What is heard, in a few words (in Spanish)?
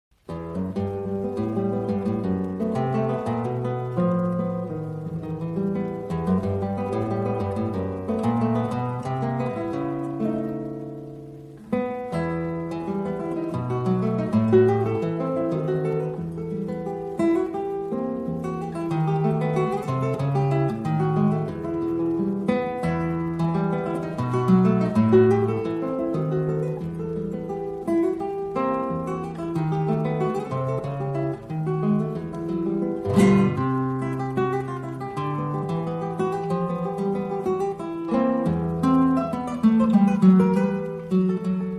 milonga oriental